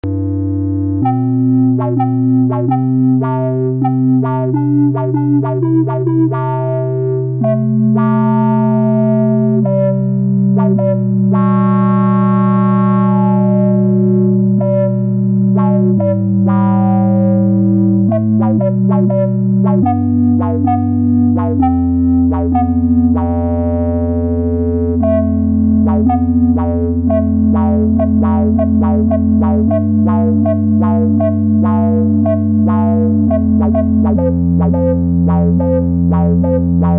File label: Dual Synthacons; analogsynth